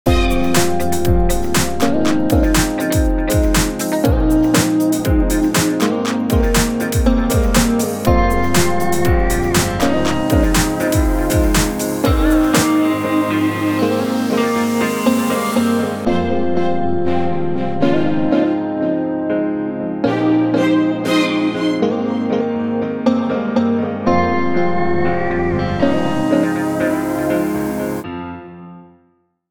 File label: Late Night Pianos